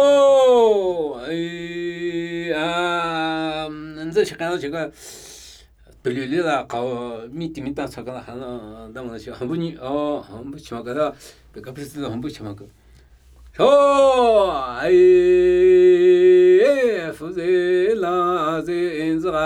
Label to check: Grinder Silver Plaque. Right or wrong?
Wrong